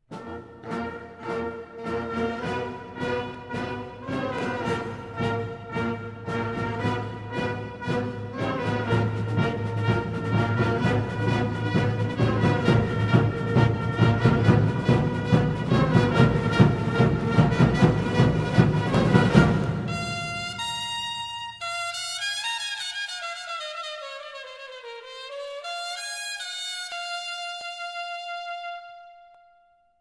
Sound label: Mexican Cheer, pep band